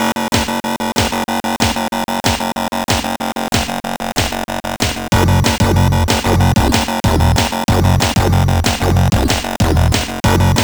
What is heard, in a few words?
amiga in game; turrican ii